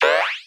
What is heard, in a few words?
jump; Nick Summer Sports Stars